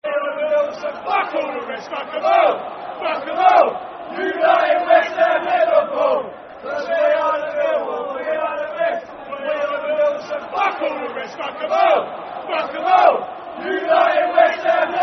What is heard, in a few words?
Football Chant (Soccer Song); Fuck 'em All; Millwall Mfc